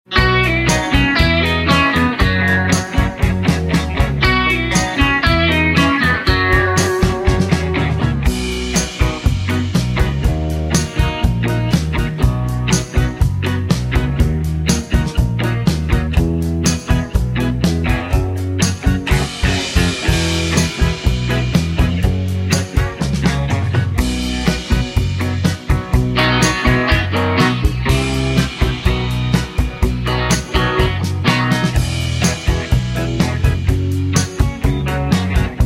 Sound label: (B) No Backing Vocals